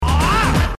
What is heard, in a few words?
whoaaaa